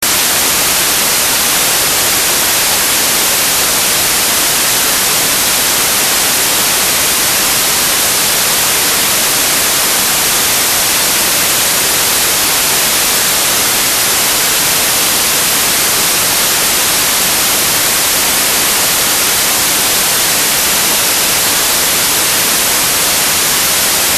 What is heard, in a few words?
grainy noise high setting; NOISECORN